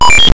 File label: appear; Cavern-Quest